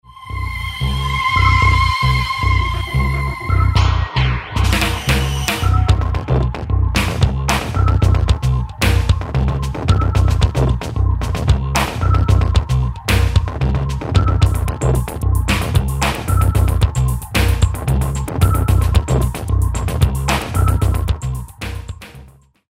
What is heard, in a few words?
distort